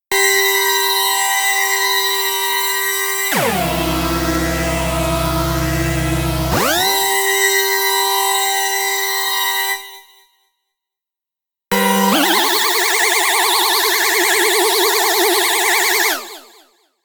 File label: Free Sampling Synth One Shot